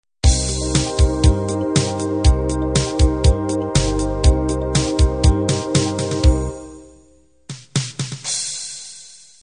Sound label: 8bt analog intro